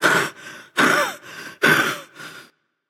nv breath; smite; xbalanque

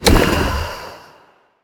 shield; smite; geb

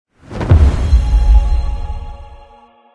angelic level up; smite; thanatos

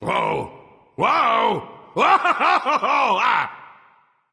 nv fear, vox; smite; voicepack; vulcan

